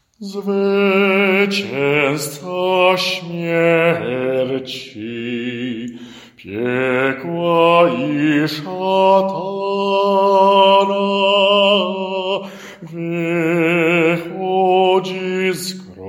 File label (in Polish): Zwyciezca smierci BAS